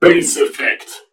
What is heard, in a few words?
bass effect drop in